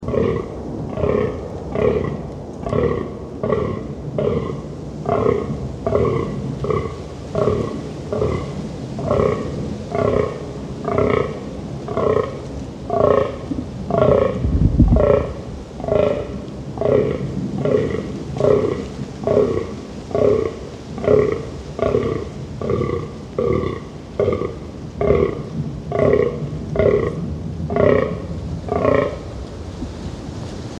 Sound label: fallow deer groaning fragment